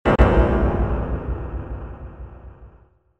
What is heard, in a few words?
Shock